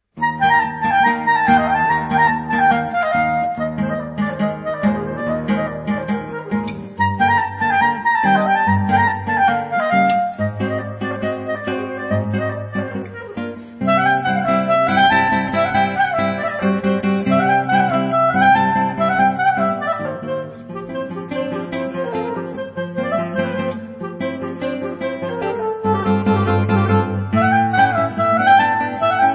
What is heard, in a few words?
ROMANIAN FOLK DANCES SUITE